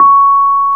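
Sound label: Piano Rhodes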